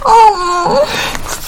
vault hit stinger

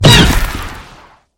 wall jump

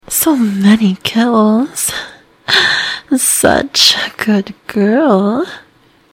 music stinger; praise kills killstreaks

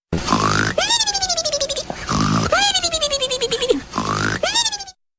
sleep, cartoon sleeping sound effect

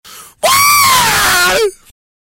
pain death; billy ghetto smosh

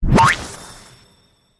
animal curse expire; kitty0706 sinclair sounds; magician

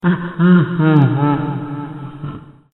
stickybomb attach; bebop; five nights at bebop s shadow bebop arrives

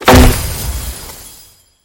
magician animal curse cast impact; kitty0706 sinclair sounds

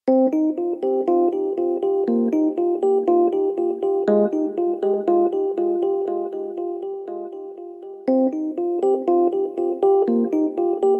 music hideout search 155bpm; Risk of Rain music pack; in-game music

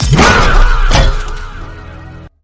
uppercut, fahhh uppercut sound; bebop

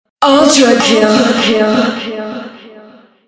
music stinger; killstreak music

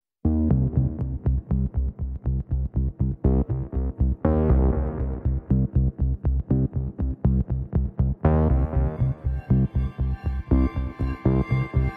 curio music; Risk of Rain music pack; in-game music

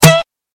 blasted refill light; billy ed edd n eddy sfx

